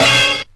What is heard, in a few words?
skrrrr, stabs